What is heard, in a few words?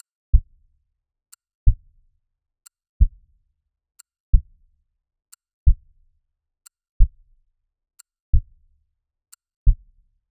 Metallic mitral valve